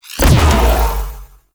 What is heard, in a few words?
Zanuka Companion Stomp Burst; Warframe; Characters; Abilities